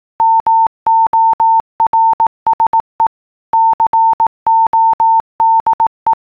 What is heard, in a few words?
Morse code